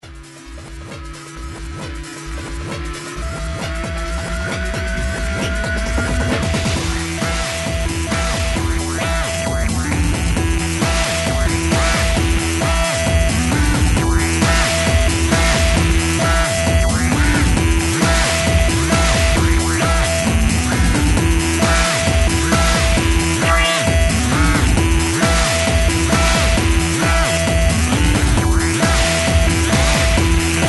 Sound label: disco